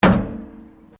METAL BIN IMPACT PLASTIC CRASH BARRIER

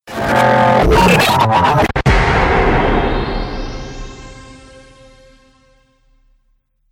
Trailer Hit Glitch; Trailer Sound Effects

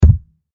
Impact Wood Hollow Hit; Miscellaneous Impact

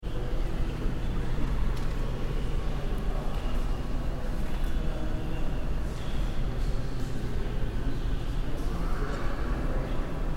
Light Crowd In Large Hall National History Museum; Distant crowd walla in National History Museum

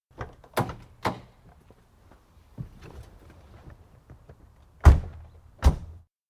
Exterior - two people enter car, Peugeot 205GT; Car Sound Effects; BBC

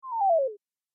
Game Fall Ripple, Video Game Sound Effects

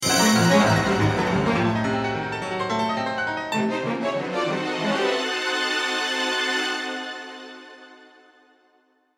Cartoon Music Action Suspense; Cartoons

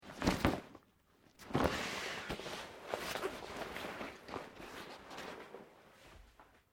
Backpack Nylon Small Put On; common day pack; Zippers (Sound Effects:Objects)

